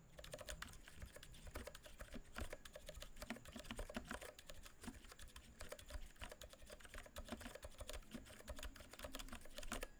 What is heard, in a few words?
cherry silent black; mechanical keyboards